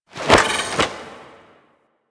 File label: Foley Sword Grab; Cinematics; Tenno; Warframe; Orbiter Cradle